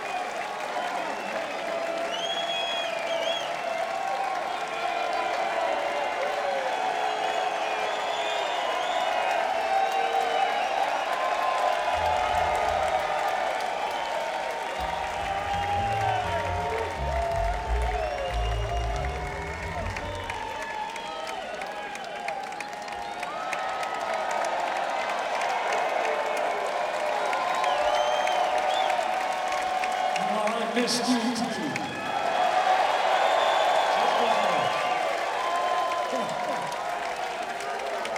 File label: crowd